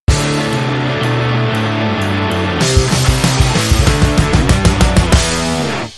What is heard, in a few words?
Downshift, Sting